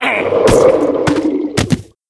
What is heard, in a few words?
mob raid die; Monster; 레이드; 동면흉신호석